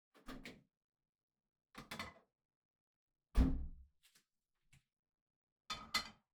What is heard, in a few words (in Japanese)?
フライパンを出す; KU100